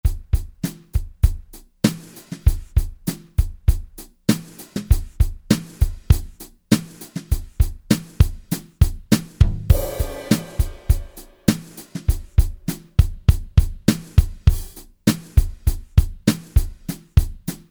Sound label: 70's Brush KIT, Roland SRX-01 Dynamic Drum Kits